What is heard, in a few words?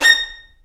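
Vio spiccato Bb5; Digital Sound Factory Virtuoso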